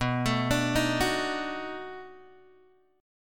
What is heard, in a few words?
B 7th Sharp 9th, down, Guitar, Open G